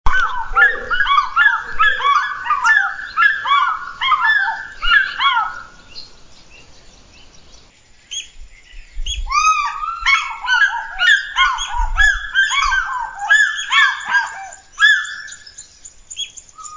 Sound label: fish eagle; Fish eagle sounds; Kenga Publishing